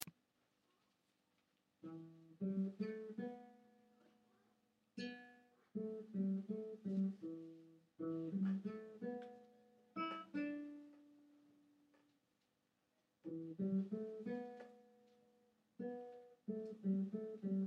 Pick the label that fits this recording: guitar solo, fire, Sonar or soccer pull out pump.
guitar solo